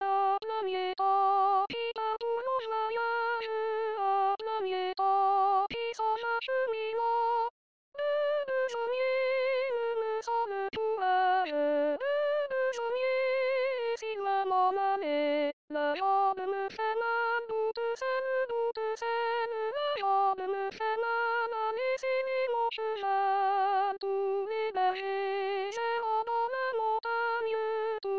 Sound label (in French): voix femmes; noel d auvergne